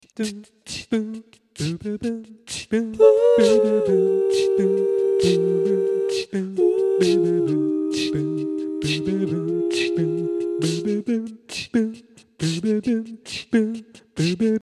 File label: phrase loop